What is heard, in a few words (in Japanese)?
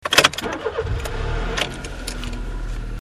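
kuruma, car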